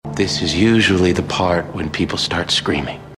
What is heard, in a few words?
start screaming